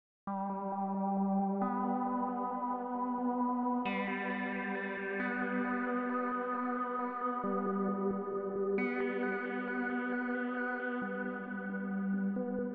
Twangy Synth

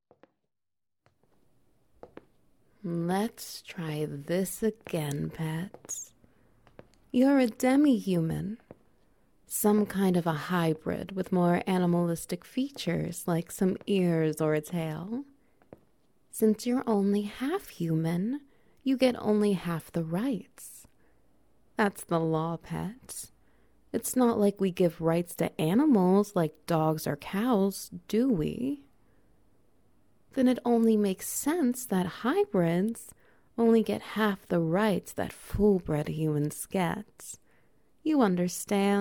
SFX Wet Noises; One Infraction Too Many

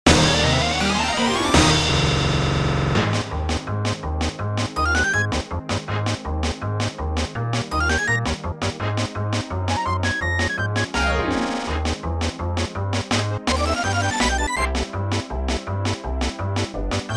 SNES Instrumental Remix; SupremeMIDI

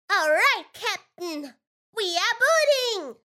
Booting Sound; ENGLISH

